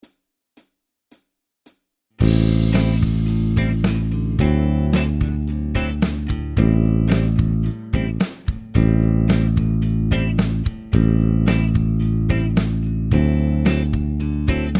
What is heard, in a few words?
Bends and slides backing